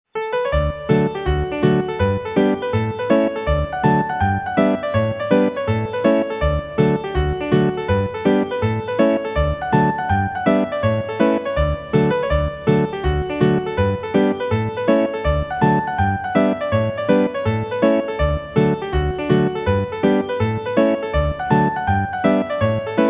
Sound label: hornpipes